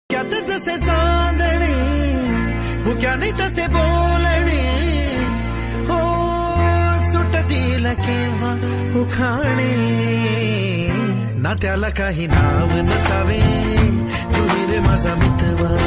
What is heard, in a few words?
marathi ringtone love ringtone